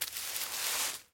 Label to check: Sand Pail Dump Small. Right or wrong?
Right